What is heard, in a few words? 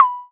PONG, IMPACTS